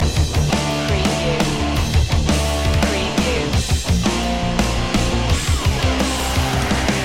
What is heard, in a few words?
8bit rocktronic loop